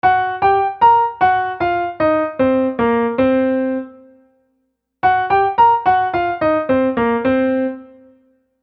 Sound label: 2 speeds